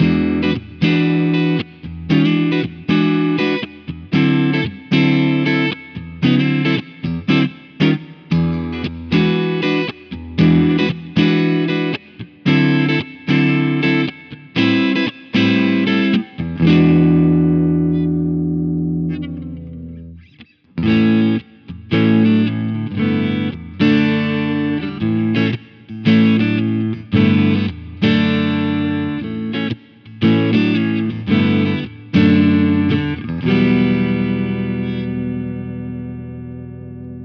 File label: Warm Clean; Gitarren-Plug-ins im Vergleich; Genome